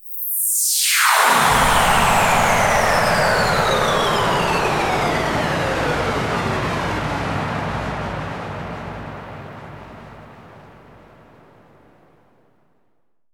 Downsweeps

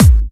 Bassdrums UK Trance Kicks; Vengeance Samples

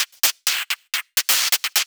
VFX2 Loop 128 BPM

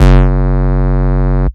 Ultimate Bass Root F#; Vengeance Samples

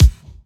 127BPM Kick; Vengeance Samples; The Mission Kit

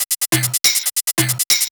Drum Mix, 140 BPM; Pitched; Vengeance Samples; Vocal-Hook Kits; Song Kit; Mr Big Boom

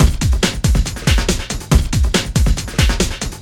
Loops BB 140, BreakBeats; Vengeance Samples

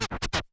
Cuts, Vinyl Record Samples

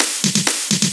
Fill Ins 128BPM; Vengeance Samples

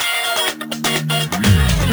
Special Sounds Root D# 125 BPM; Vengeance Samples; VEH3 Special Sounds

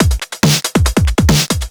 Breakbeat 140 BPM

